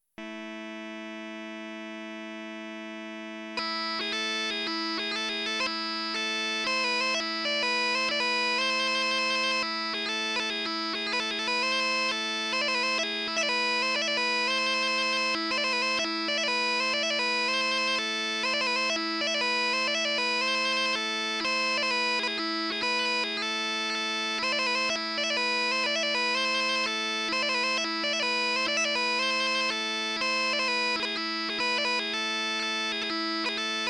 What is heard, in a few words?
swedish; technopipes